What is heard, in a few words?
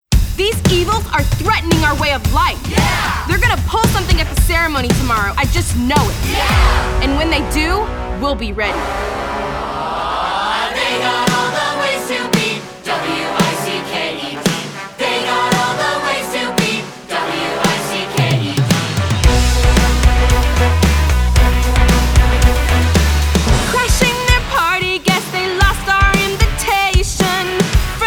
guide vocals